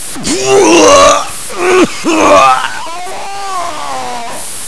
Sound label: Die; USEC